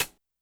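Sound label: Hi-Hat Closed Tight H; YAMAHA RM1X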